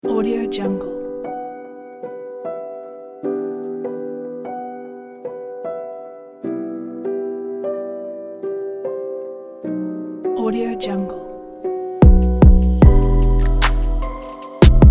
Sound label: hiphop piano beat; beautifulmix